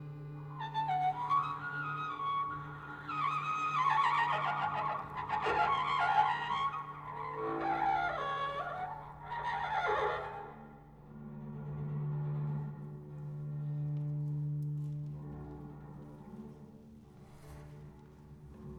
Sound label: Improv Interlude; Nicolas Caloia; sound of montreal